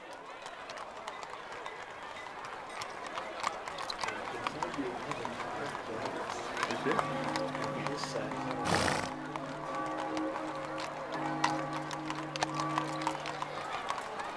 City Middle Large Bed; Ambient; Civ4 beyond sword

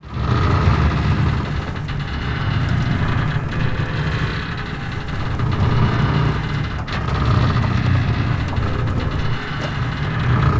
Wings Overload, Damage; DCS

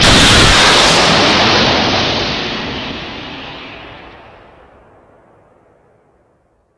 Tow Launch; Weapons; DCS

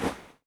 Inf Weapons Foley; Red Orchestra Ostfront 41-45

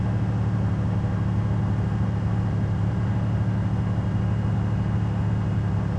tank engine int rpm; War Thunder

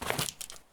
shotgun pickup; Escape from Tarkov

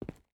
footstep rubber boots concrete walk; Rust

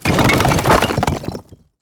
objects, World of Tanks

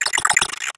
drone chirp

